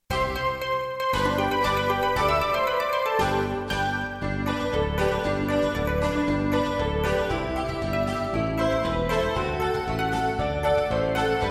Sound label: Jingle Bells; Christmas